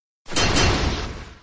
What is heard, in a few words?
hell claw sound; Arc of Templar